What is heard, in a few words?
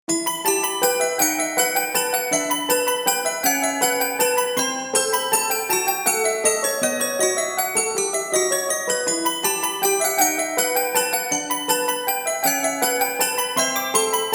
baroque; SampleTank 4; alternate keys